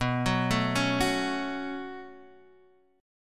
B 7th Suspended 2nd, Guitar, Half Step Down; B7sus2

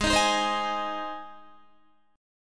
Ab Major Ab down Guitar; Ab strummed

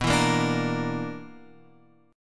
B-Minor Major 7th Double Flat 5th

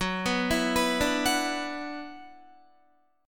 Gb 7th Suspended 4th Sharp 5th Gb Guitar

